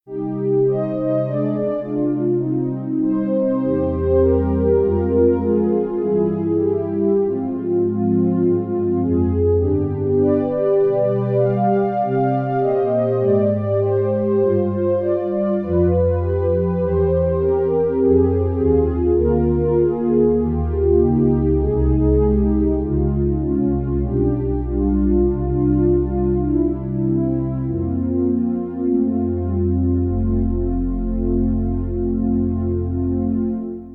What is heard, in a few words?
Synth Pad